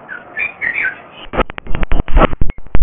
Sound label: Bird Chp